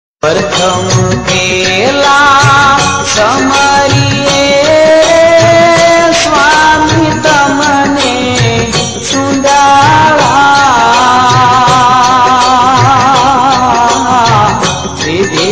Gujarati Bhajan Ringtone; ringtones

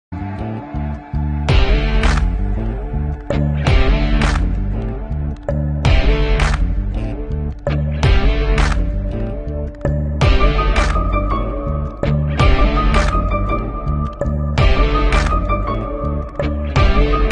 Theme Music Ringtone; Vikram Vedha

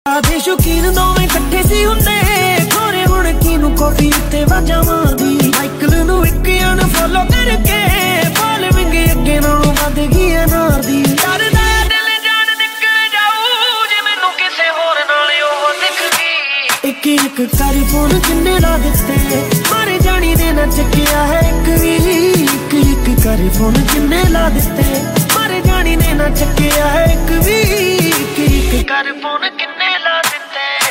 New Punjabi Song Ringtone

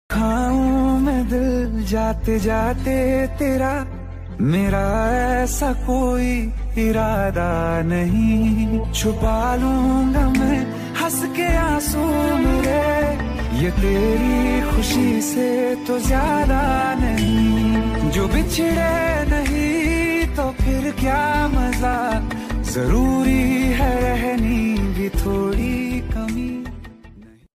Sad Ringtone

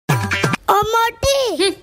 SMS Ringtone

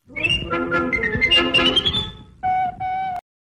Funny SMS Ringtone; Popeye

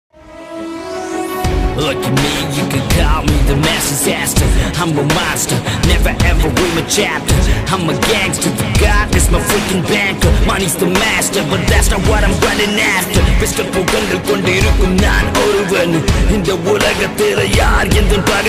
Tamil Movie Ringtone; Thani Oruvan

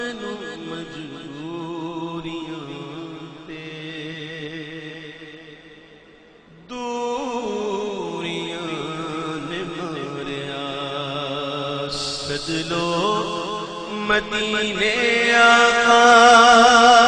Naat Ringtone

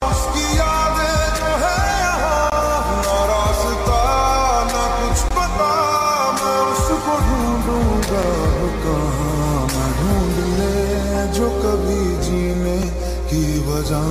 Breakup Ringtone

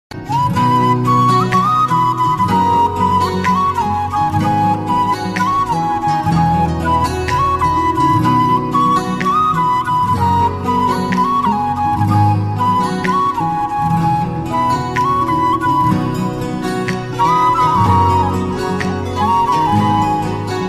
Flute Song Ringtone

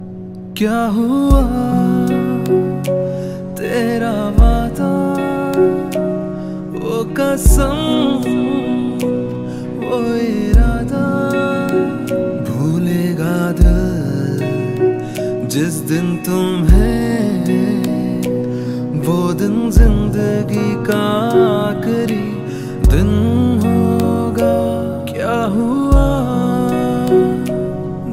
Sad Hindi Song Ringtone

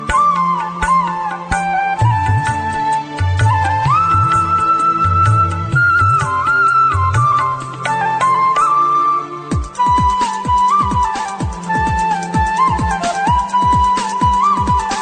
Indian Classical Flute Ringtone; ringtones

